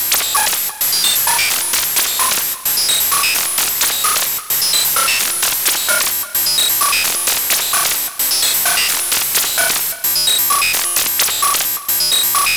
Synth Sequence; Eclectic Electronica

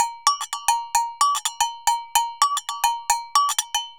AGOGO, DRUMS SAMBA; Best Service ProSamples vol.14; AKAI

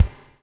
BUBBLE; Spectrasonics - Backbeat

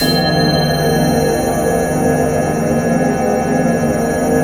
A#3 BELL; Northstar; The Wizard VOL-1; BELLTASY

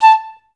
STAC A4, Advanced Orchestra; Best Service; Peter Siedlaczek's Advanced Orchestra

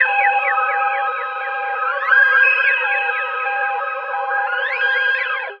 WARNING; ILIO; Trance Fusion

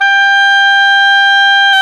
OBOE, mf f; Roland L-CDX-03 Disk 1; Oboe 2&3

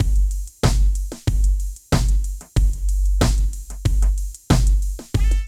DRM LP; Phat & Phunky; East West

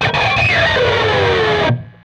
PRS SCRAP, Guitars; PRS SCRAPES

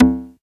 Percussion, House

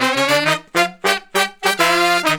Horn Section

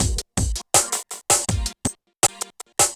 Melody Red, Synchro Funk, Beats; Sonic Foundry (Sony Creative Software)

